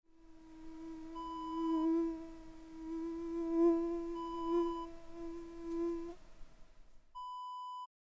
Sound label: mosquito buzzing